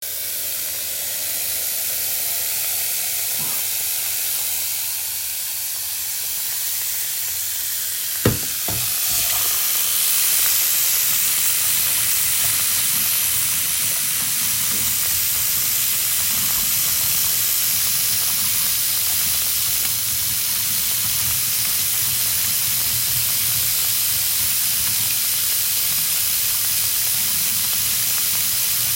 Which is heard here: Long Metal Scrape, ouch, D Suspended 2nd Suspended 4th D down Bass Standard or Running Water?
Running Water